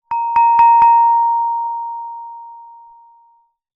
musical instrument gender gamelan single mallet hit x4